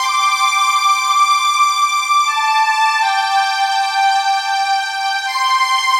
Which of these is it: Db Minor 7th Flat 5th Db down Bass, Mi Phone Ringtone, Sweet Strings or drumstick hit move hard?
Sweet Strings